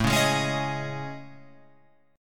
A Major Sharp 11th, down, Guitar, Open F, AM#11 chord; strummed; JGuitar